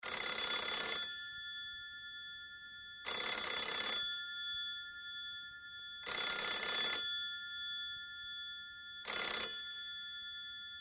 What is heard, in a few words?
telephone